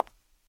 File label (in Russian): zvonkij korotkij chyotkij zvuk shaga kablukov po parketu